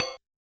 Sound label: Kit Hat, Realistic Concertmate-670; One Shot Kit